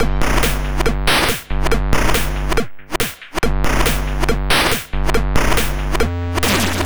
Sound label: 140 Combo Gm, 140 BPM; CHIPSHOP DELUXE; CHIPSHOP LOOPS